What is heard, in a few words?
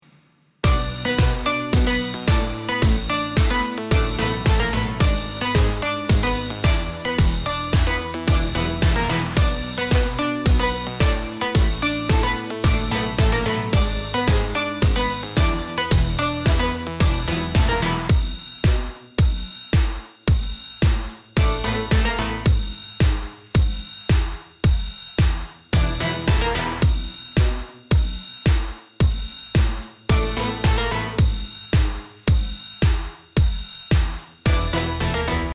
melody